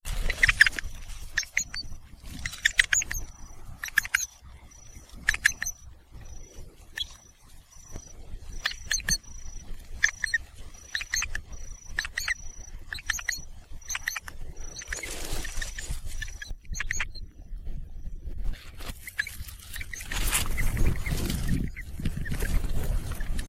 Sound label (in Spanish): lechuzon de campo; Asio flammeus